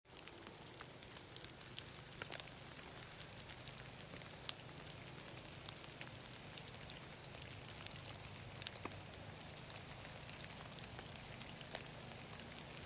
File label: Rain in the Woods